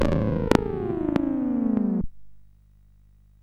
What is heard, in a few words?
disk version MOS6581R4; Tai-Pan